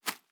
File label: Leaf Run Surface, Footsteps; Survival Game Sounds - Lite Edition